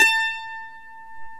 MANDOLIN

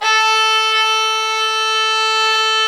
STR VIOLA, Str Quartet, Strings

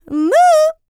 licks, vocal, dance; ILIO; Vocal Planet

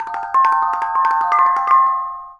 KONGWONG; Spectrasonics; Heart of Asia; KONGWONG PH

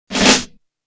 Fill, Snare Fills; Drums

